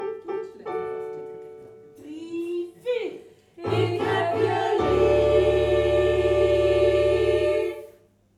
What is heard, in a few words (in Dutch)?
Pastorale vrouw; vrouwen 3 stemmig; SINT-CECILIA KOOR ROTSELAAR